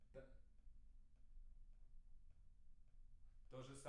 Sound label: PIANO